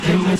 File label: rim